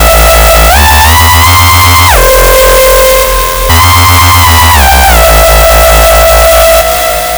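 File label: bass flute on acid; fabrik pro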